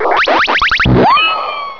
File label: Newlevel